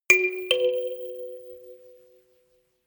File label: notification kalimba rattle fourth up